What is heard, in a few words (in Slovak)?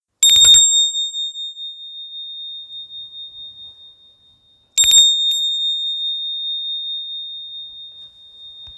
zvonček mini, tibetské